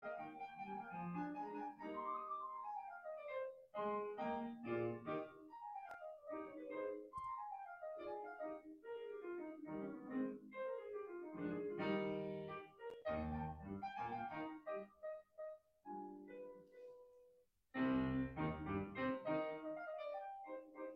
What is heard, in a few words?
Piano; annual piano festival in panama city